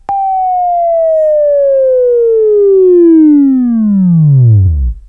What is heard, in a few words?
Buzz; Labview Program; Sweeping Training program